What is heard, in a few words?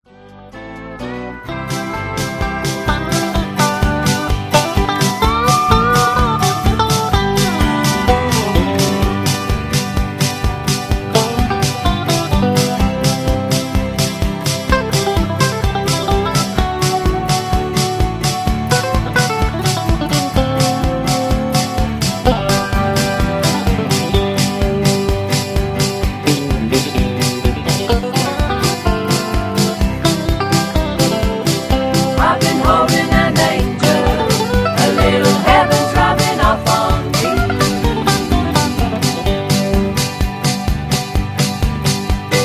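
instrumental with background vocals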